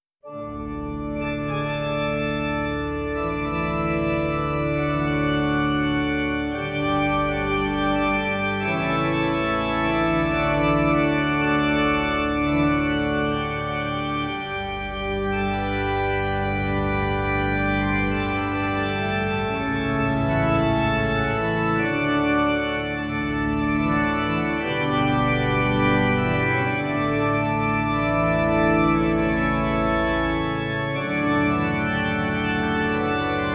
Italy Organ Fantasy; rise of venice windows gamerip 2013

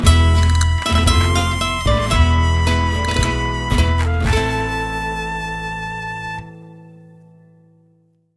Tapas del Mar (Transition); Cooking Dash

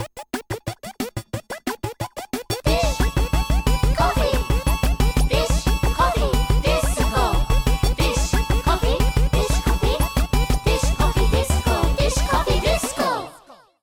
disco; dish coffee

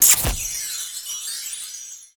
Kissfrog Trans; Levels Hub Mansion; Fx Kissfrog Transform

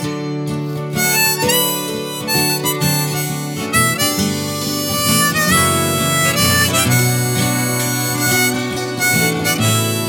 Go Slow Waltz Loop; Girls Like Robots; Wii U

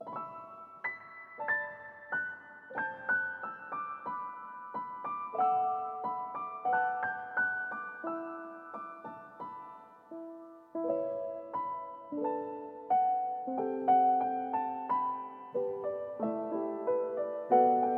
piano; noahmund; berani

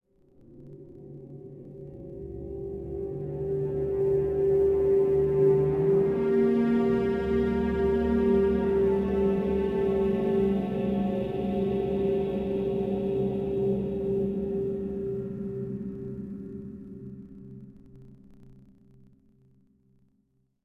environment; Backstab; Android

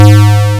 Restore Down; Battlezone

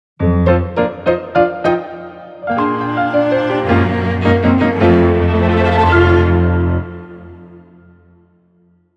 Skill Learning Jingle; Umbran Dance Intermediate Steps; Cereza Skill Learning Jingle 2; Bayonetta Origins Cereza and the Lost Demon original soundtrack 2023

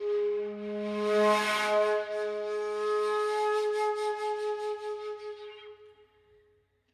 Flute Shakushaki; unruly heroes

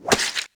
Place sticker; LittleBigPlanet 2; PS3

